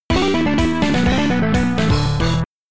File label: Stage Complete; Battletoads; Amiga